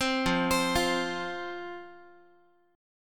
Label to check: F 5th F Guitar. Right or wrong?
Right